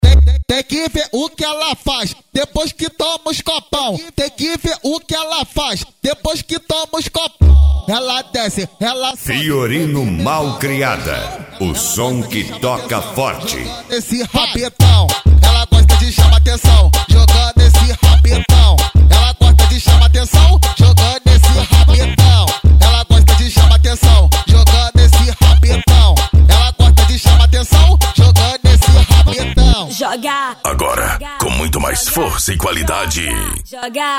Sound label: mega funk bass